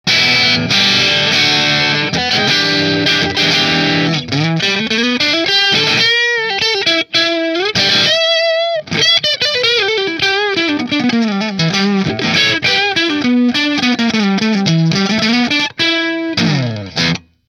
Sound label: Nola Voodoo neck split marshall, guitars; natural